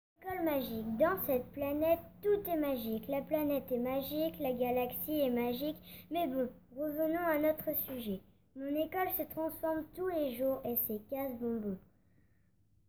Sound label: Vocal